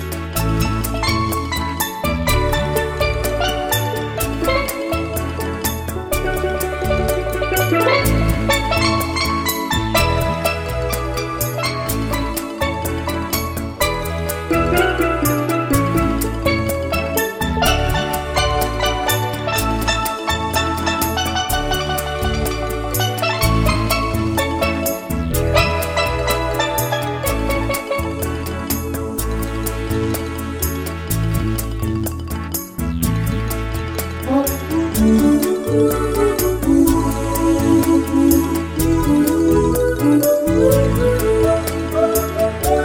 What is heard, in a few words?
Calypso